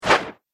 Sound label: Dagger Throw Whoosh; Combat Swing Sound Effects